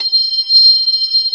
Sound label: B3, Drawbars; Keyboards; E-MU Producer Series Vol. 2; More Studio Essentials